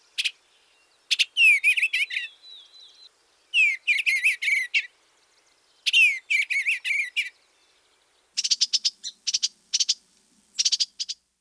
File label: Eastern Bluebird